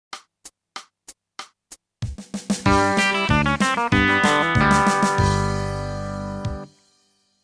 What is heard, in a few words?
country